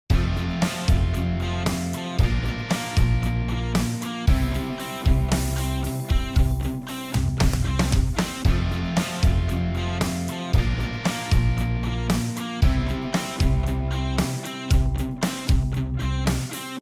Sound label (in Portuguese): harmonia IV bVII I